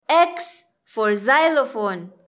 xylophone; grade1; english; identifying letters third

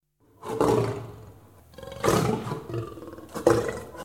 tiger; grade1; sound sci

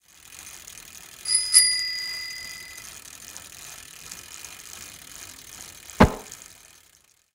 cycling; science; air water and mud

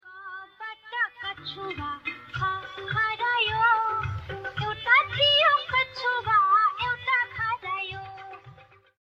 radio song; grade3; new environment pollution

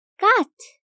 wood; grade1; properties of things

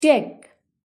click; grade1; properties of things

